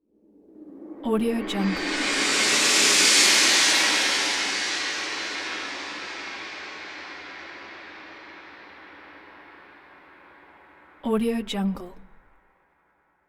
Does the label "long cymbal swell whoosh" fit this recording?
Yes